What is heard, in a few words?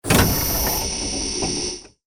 hydraulic door